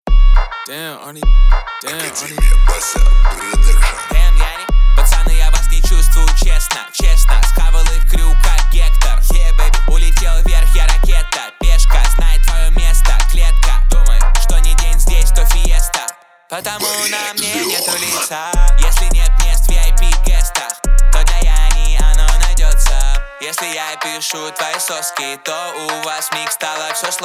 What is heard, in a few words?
29-33Hz Low Bass